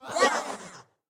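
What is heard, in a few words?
jump; Em Pulo; Super Mombo Quest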